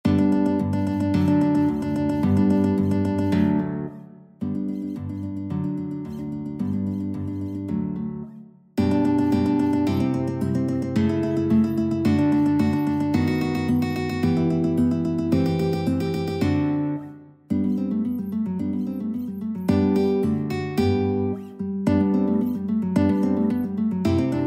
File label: Concerto for Two Mandolins guitar duet